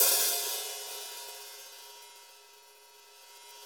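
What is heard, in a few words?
cymbal, Naturals; Club-50 - Foundations Roland; Natural 15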